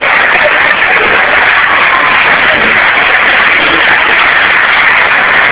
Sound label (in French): Applaudissements